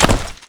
grunt asphalt land

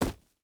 footstep hide gravel jump land; Rust

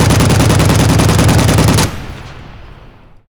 plane; World of Tanks

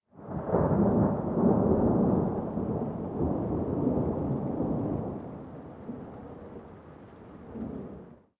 thunder light; Rust

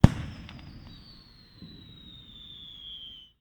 hangar; War Thunder

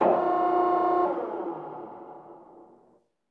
ac130 hydraulics

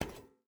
footstep barefoot metal walk; Rust

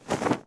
Cloth Move; Civ4 warlords

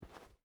footstep rubber boots cloth walk; Rust

